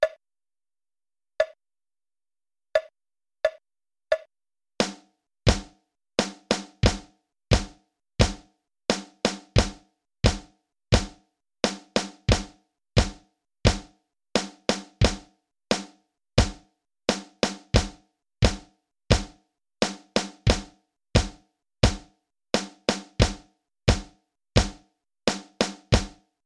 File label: Drum Recordings; drums